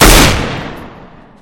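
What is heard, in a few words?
weapon SSG 08 sounds; iz igry cs go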